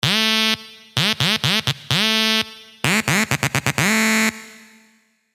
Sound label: Sylenth1 dirty dutch leads; Any Louder; revealed sylenth1 dirty dutch leads vol 1